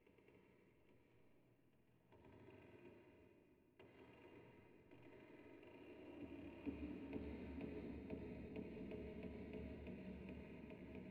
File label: metal groan; Rust